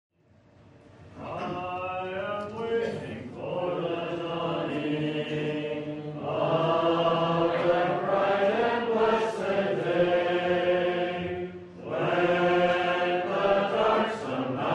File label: Hymn, Gospel Hall; 2015Conference